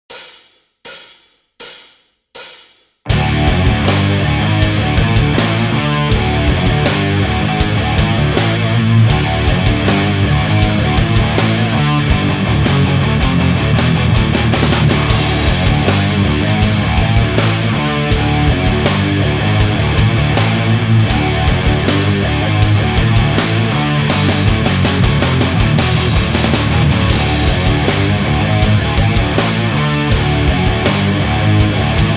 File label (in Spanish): patrón simetrico BT